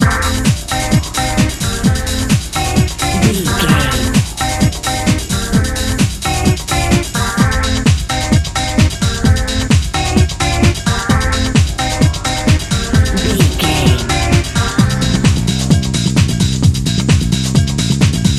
gonna get busy, Dance, EDM, Club, Exercise, Aerobic, Aerobics, Spinning, Workout, Disco, Eurodance, Confident, Energetic, Cheerful, Happy